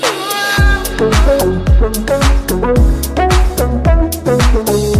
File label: deep house